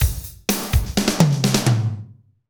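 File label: medium tekno, Roland drum beats; Northstar - Drumscapes Roland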